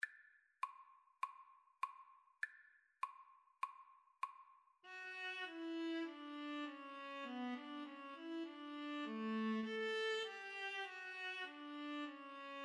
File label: viola, Viola Duet